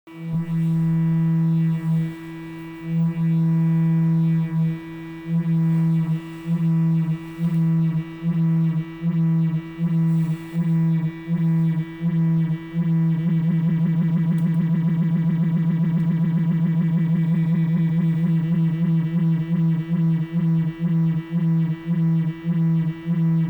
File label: Maestro flanger test